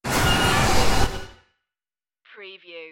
rusty starship door

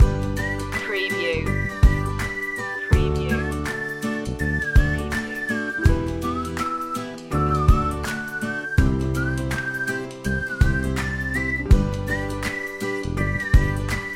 teamwork loop

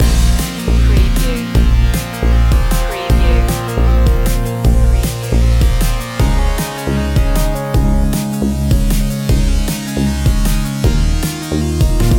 chilled funk loop

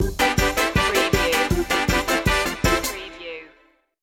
happy ska town stinger

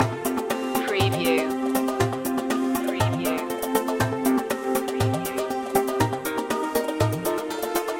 solar wind loop

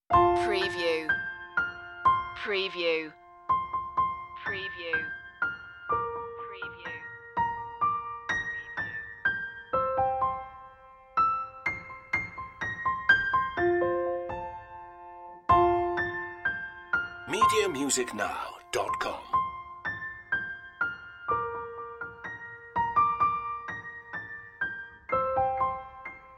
jingle bells light piano 62bpm

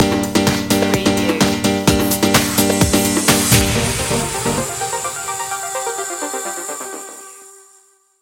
club breakz stinger

